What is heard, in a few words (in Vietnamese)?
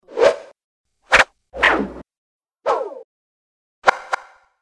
tieng am thanh chuyen tiep